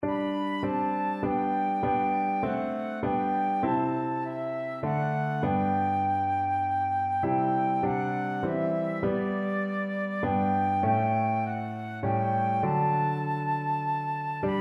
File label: faith of our fathers, flute